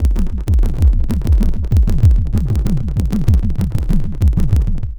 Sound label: Synth Bass; Bass X